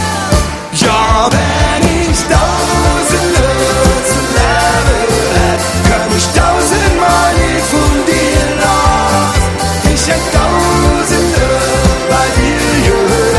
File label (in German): live mit orchester